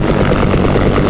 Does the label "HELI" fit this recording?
Yes